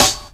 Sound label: 00s rap steel snare drum sample f sharp key